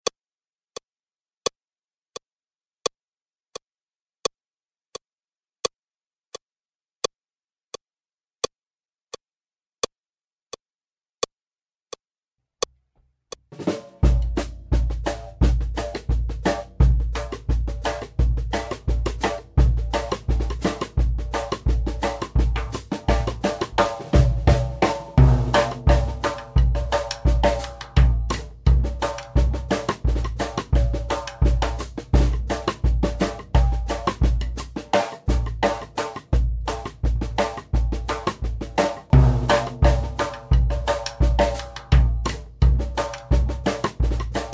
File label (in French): Frog legs rag Accompagnement seul, piano; Jazz; James Scott